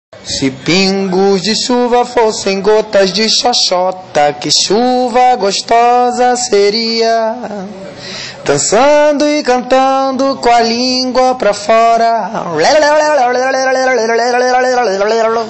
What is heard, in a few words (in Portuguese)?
pingos de chuva